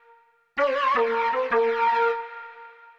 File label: flute, ethno, 160, C